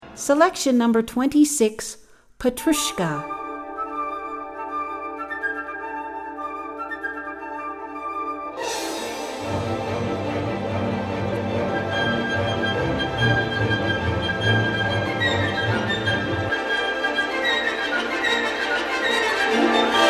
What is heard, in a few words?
petrushka, classical